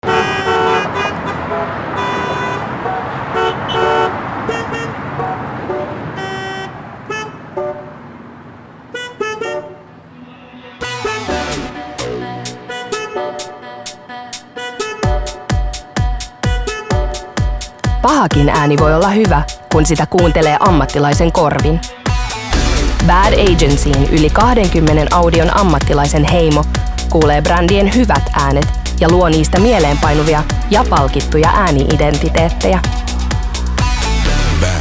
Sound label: horn; bad agency